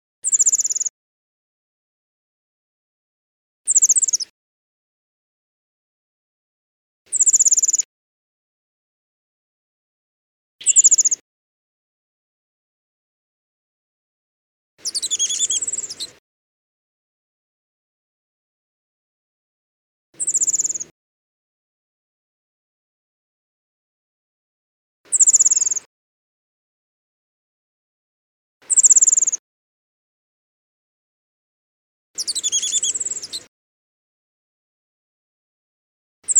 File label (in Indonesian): suara alarm burung cikrakperi biru